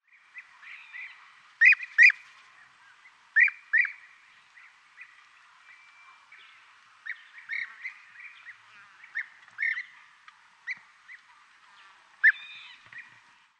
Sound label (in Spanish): Abejaruco europeo